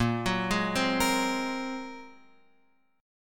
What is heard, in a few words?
A sharp 7th Suspended 2nd Sharp 5th, Guitar, Open F; arpeggiated; JGuitar